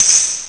rassel; gemx; Amiga Soundtracker Sample Packs

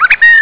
moewe; Pirates; ST-XX Amiga Soundtracker Sample Packs

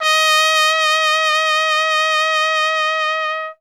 TRP WEICH, Alpine Volksmusik; Best Service

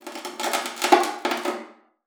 KNUSPER, VIOLA FINGER; Xsample VOL-5

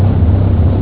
maschine; ST-XX Amiga Soundtracker Sample Packs

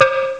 Blech; ST-XX Amiga Soundtracker Sample Packs

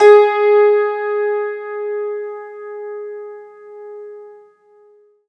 HACKBRETT; Alpine Volksmusik

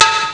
Geschirr; ST-XX Amiga Soundtracker Sample Packs

